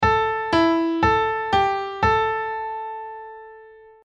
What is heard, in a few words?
Minor Going Down; minor key going down piano quiz